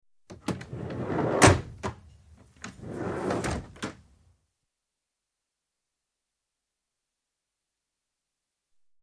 Railway Carriage, interior, compartment double doors opened; Train; BBC